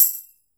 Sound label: soft hitwhistle; osu!